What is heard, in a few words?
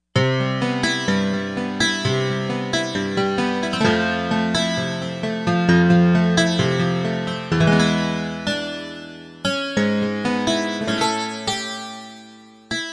Folk Guitar, Yamaha TG55; Factory Presets